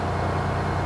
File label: fan